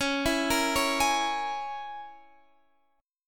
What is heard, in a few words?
C sharp Minor 6th C sharp, Guitar Standard; x,16,14,15,14,16; C#m6 arpeggiated; capo=3